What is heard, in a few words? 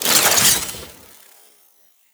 Finisher Parazon Equip; Kuva Lich; Warframe